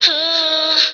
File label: "ho" SUNG, voice; ooh ahh